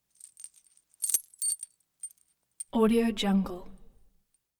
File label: coins or keys jingle